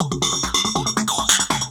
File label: Throatbeat, Industrial, Beats; Sonic Foundry